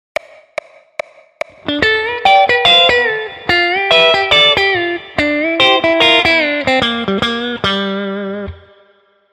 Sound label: pivot note oblique bend